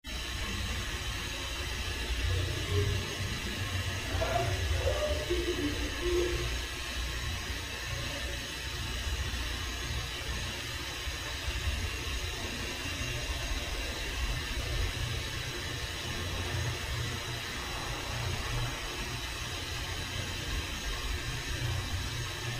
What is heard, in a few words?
Piano, Clean